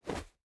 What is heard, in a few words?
Jump; SandBox Musics